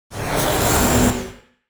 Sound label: Rusty Starship Door